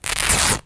cloth; cstrike; de dust2 2020

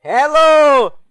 knife kill; flash new knife

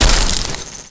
debris

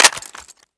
hit, m4a1; weapons

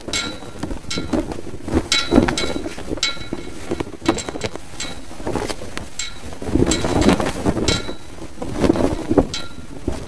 wind, flag and rope loop; ambient

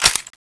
p90 clip release, weapons

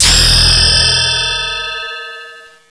level up; kickball

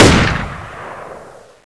ak47 long; coil

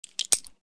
stilletto flip, knife; csgo; knife stilleto; cstrike

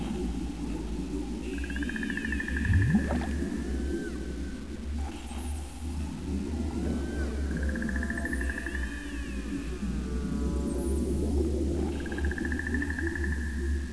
wind; krustykrab

